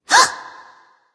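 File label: nonverbal surprise; smite; ratatoskr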